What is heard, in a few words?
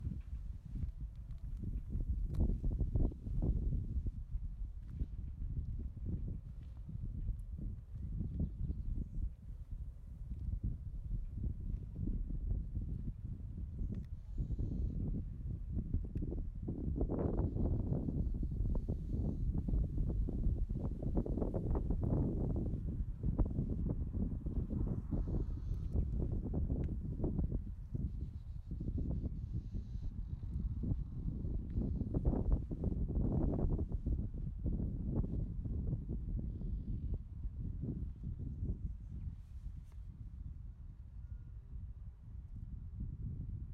Silence Ambience